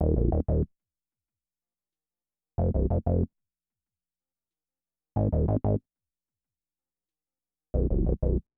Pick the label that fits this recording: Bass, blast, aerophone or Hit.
Bass